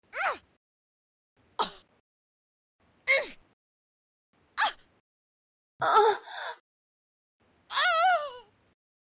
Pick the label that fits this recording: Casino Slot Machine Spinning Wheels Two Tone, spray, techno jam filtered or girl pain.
girl pain